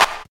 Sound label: natural clap single shot c key, Royality free clap sound tuned to the C note; single hits one shots